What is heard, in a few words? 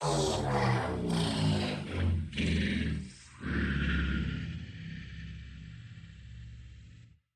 Dead Robot